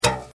Fingerschnipp auf Alu

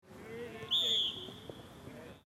Fussball Pfiff

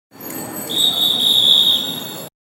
Pfeife

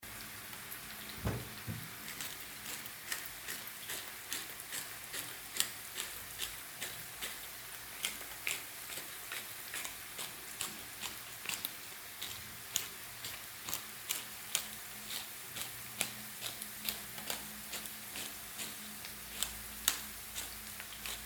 Pfeffermuehle und Bratpfanne

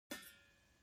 Deckel

